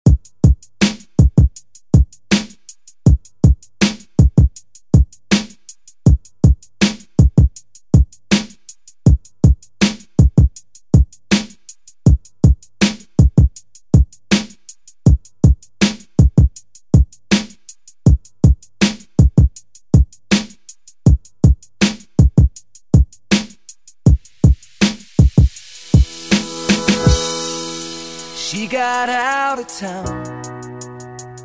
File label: Rock Redrum; Deejay Hit